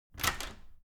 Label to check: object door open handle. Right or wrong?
Right